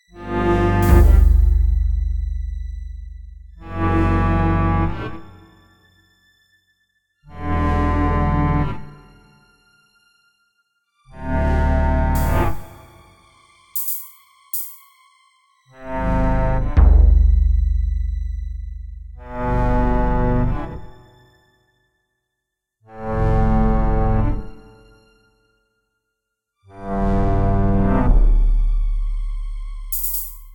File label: Suspense Theme